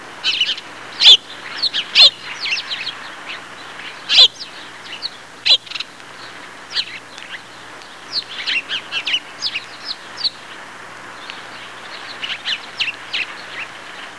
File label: many birds; ambience